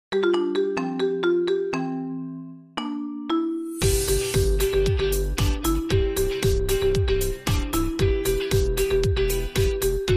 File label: Marimba